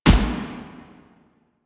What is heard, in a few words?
Grineer Assault Rifle Fire; Weapons; Warframe